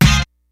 G-FUNK KIT, DRUMS; Kid Nepro; Elite

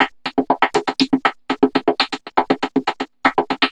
Vocobeat; Sonic Foundry (Sony Creative Software)

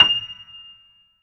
GRAND PNO F7

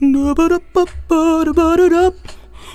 FEMALE, Hip Hop

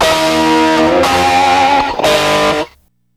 GRUZZ RIF, Stereo Guitars; Hip Hop; E-MU Formula 4000 Series Vol. 1; Hip Hop Nation

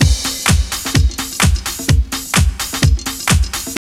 house loop

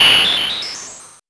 Polyfusion Modular, synths

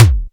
elec k, Electronic

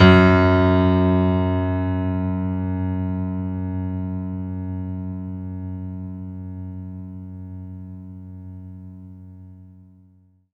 F#2, Synth Line

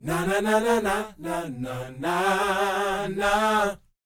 NA-NA G; Spectrasonics Vocal Planet

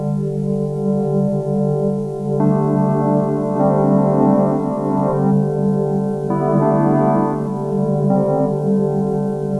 Wavering; Sonic Foundry (Sony Creative Software); Loop Noir

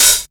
HH OPEN; Big Beat Samples & More; AKAI; HHOPEN1 KIT

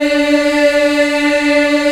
D3 WOM EH, Choir; WOMEN; USB Soundscan vol.28; Acoustic & Synth; AKAI

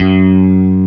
GUI STRATO, E-Guitar; E-GUITARS

